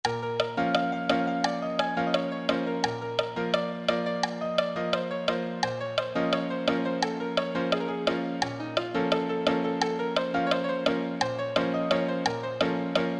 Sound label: solo, piano